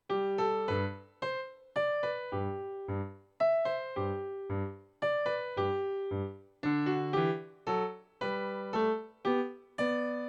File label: Minuet; Scholtze